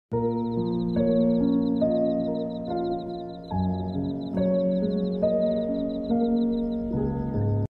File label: Spiritual ringtone